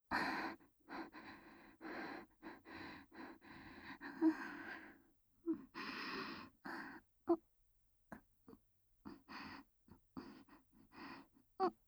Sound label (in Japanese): 雨音, ASMR; EX04. 雨音フォーリセックス